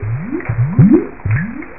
blubber; freetrading

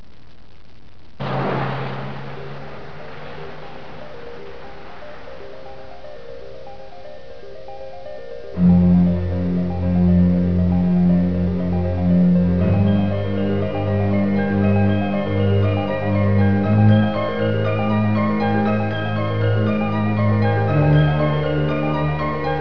whis wind, scifi; Hidden Truth

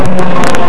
flyby boosh

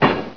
Hammer; Railroad Tycoon

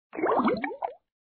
lost bubble sound

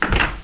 put cog; HereticII; items

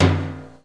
boom

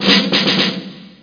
Snare Phrase; SpecOps2MPlayerDemo; Music

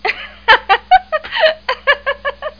GIGGLE

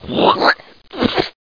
hock ptooey; attic

